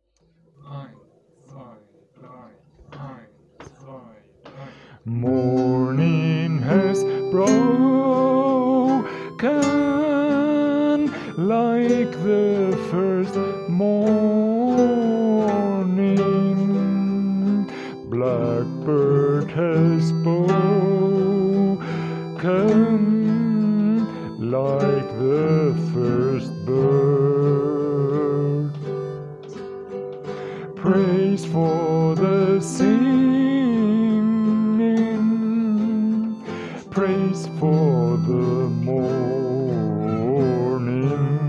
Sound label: bunessan 3 voices chords; Morning Has Broken (Bunessan) - harmonica & guitar & chords & vocal